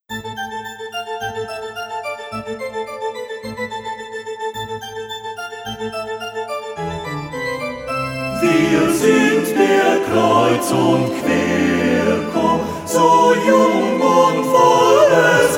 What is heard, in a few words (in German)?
Kreuz Und Quer Chor